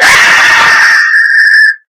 alien scream, jumpscare; The Monster Plane; kolmods jumpscare

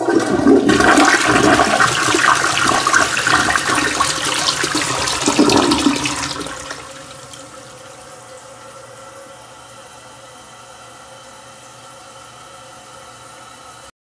toilet death; tv god corvus; skibidi3 s

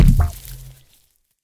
button; skibidi toilets zombies nextbot; bablo; devolve

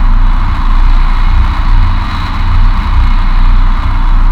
radiance loop; mecha titan catnap

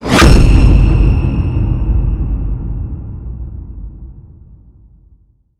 Jumpscare END; househead nexbot

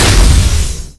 instakill; nightmare huggy

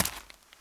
step; demon dogday

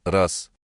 song; skibidi trash

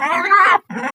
pain; The Monster Plane; kolmods preatt

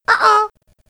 hunter pounce miss; huggy wuggy snpc; elmo

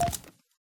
grab item; grabpack upgraded; pp3

